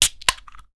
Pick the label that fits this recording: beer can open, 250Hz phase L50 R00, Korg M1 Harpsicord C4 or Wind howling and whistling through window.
beer can open